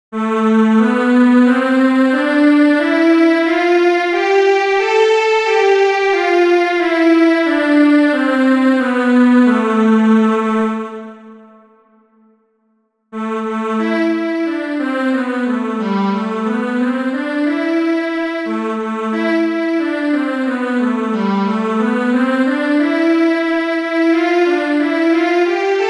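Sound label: Natural Minor Example; natural minor scale; "God Rest Ye Merry Gentleman"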